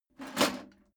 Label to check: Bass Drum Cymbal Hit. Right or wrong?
Wrong